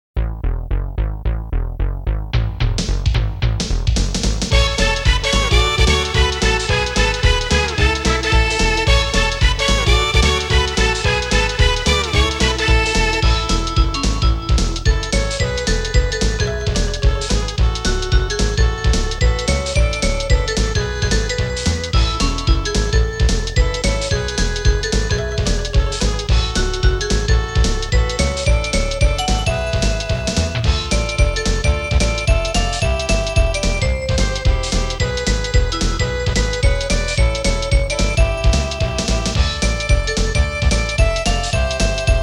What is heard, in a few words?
Beat; dolucky's sandlot baseball